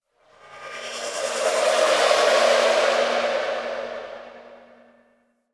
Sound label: Exterior Phases, Ambience; Warframe; Rail Jack